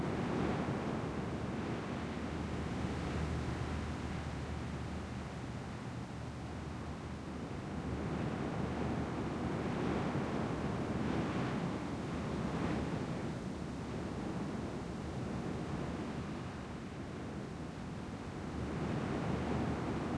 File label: ferry, lake